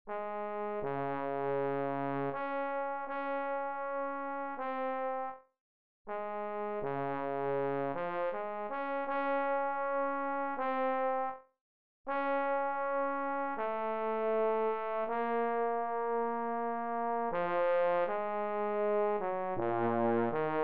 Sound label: Trombone